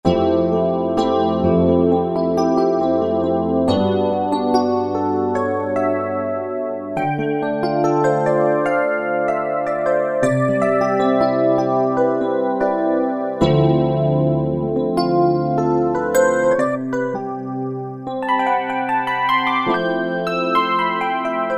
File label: Super EP; Factory Combi demos